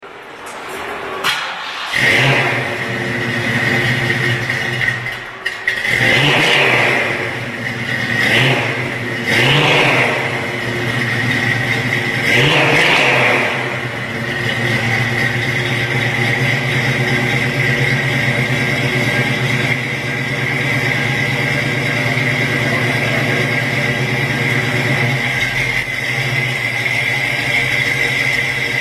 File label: Mustang 351C, custom exhaust systems